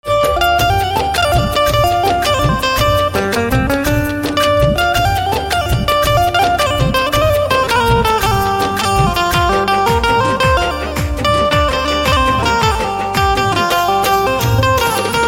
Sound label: Banjo